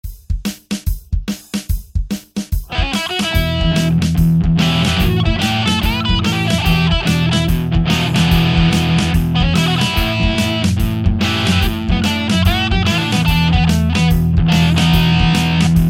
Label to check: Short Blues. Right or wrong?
Right